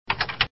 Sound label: chat